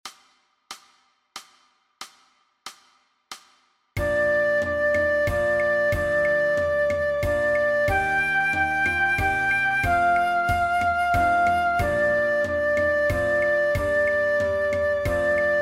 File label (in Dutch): MEESPEELTRACK Dwarsfluit Alternate; Dwarsfluit aangepast; Nij Talint Orkest